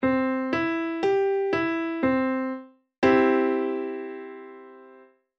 degre I; tonalite majeure

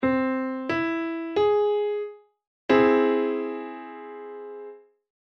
accord augmenté

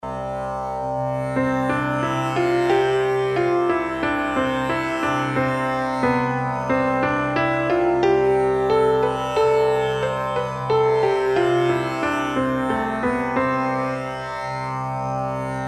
ionien musique

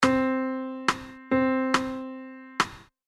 noire pointée, la rythmique

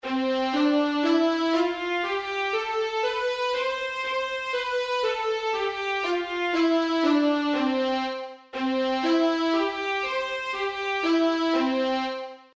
majeur, gammes; faites vos gammes

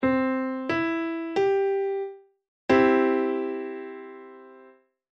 accord majeur

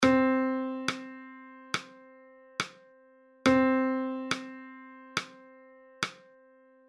ronde

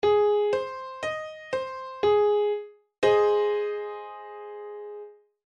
degre VIm, accords degres tonalite mineure

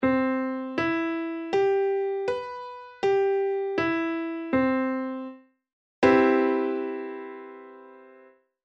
maj maj7, accords de septieme

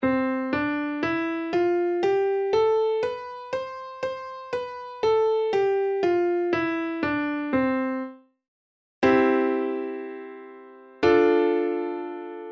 gamme accords plaqués; tonalite majeure

